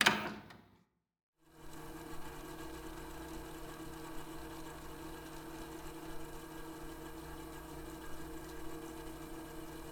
vhs entering; GameJolt

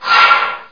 Scrape

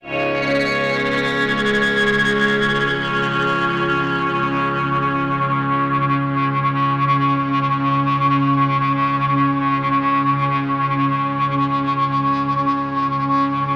Overlay Drone

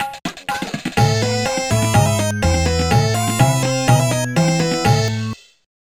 Dance

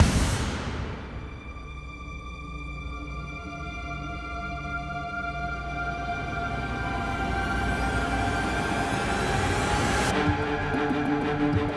Epic Action Orchestrations Full Mix intro; Halo Jug Theme; Fallen Knight

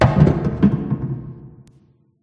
Kick; Five Nights at Ronald's

